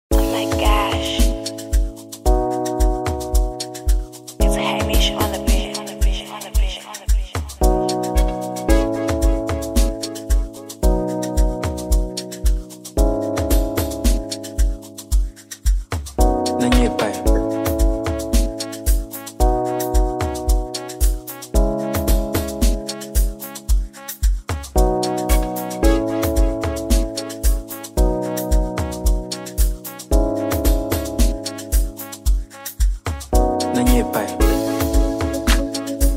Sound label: Amapiano Version